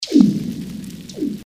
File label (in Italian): esplosione, rumore subacqueo; acque marino costiere e lagunari